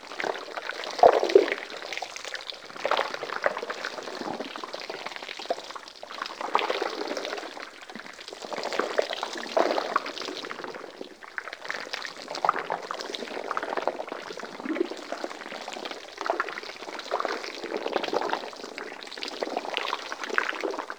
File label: Infested Gore Loop Thick Slow; Ambience; Props; Warframe; Infested Eris